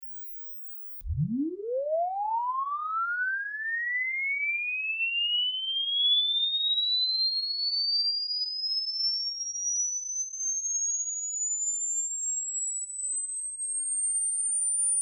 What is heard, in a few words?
sweep -12 dBFS